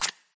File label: card slide